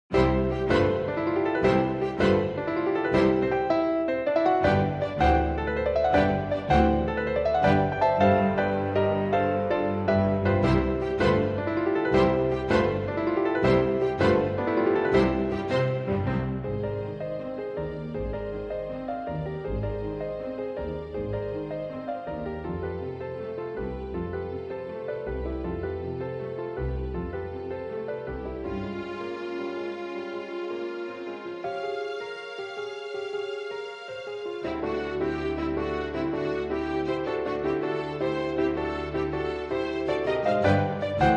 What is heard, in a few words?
Concertino for Piano or Harpsichord Strings